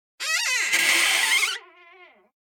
Door - Wood Creak Sharp; Hollywood Edge; sound designer's toolkit v2

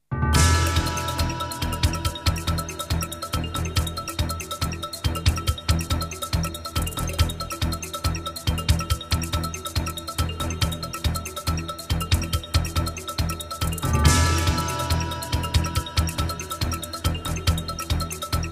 Jingles; 90 DDD Jingles

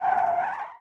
skid short; Blind Drive

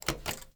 BUTTON Spring Press; mono; Universal Sound FX

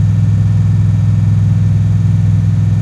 Truck Explorer Loop; Trucks

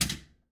Pinball Bumper

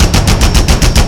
Assault Rifle Shot Loop; SFX Kit; Weapons

